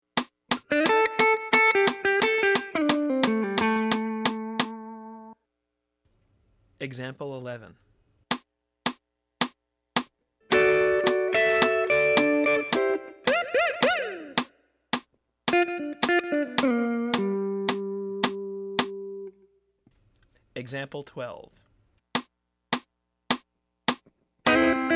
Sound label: Sample Licks, Rock Guitar; Rock Licks Encyclopedia; Publisher: Alfred Music